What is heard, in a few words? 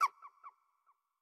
Bat Hurt